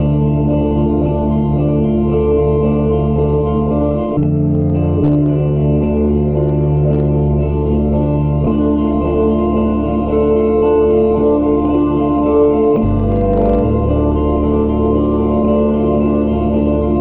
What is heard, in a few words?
guitar and strings, Sad